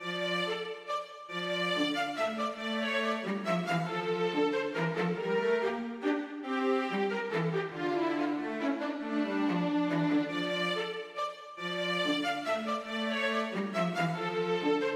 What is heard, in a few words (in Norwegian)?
Menue for fiolin og cello; Bang